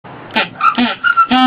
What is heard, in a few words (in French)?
Pingouin